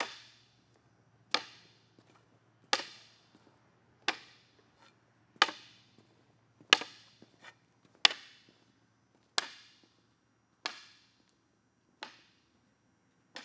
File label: Foley Footstep Walking With A Cane; Foley Footsteps